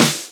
Snares; Vengeance - Essential Clubsounds Vol.4

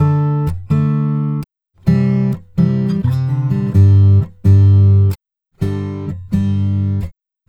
Acoustic Latin Guitar 128BPM Gmaj; Vengeance - Essential Clubsounds Vol.4

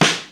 Snare Smack; Lost Tapes From Mars

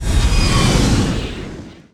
sfx sci fi; Masterbits - Switch FX

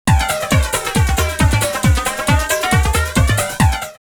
Trance 136, drumloops Techno House; Trance Europe; X-Static Goldmine

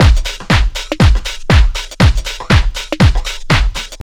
house loop

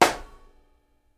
SNARE, Live Snares

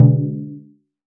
Wadaiko, Taiko, Percussion; Roland BK-5

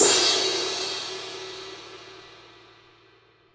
Akai XR-20, Drum Machines, Cymbals

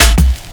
Snare and Kick, One Shot Drums

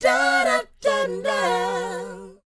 DOWOP C#; ILIO; Vocal Planet

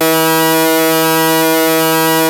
ARP 2600 B3, synthesizer; Hollywood Edge - Giorgio Moroder Rare Synthesizer Collection

